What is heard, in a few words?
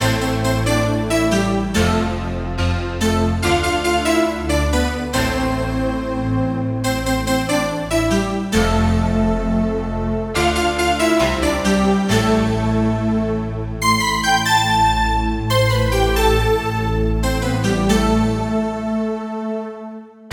pad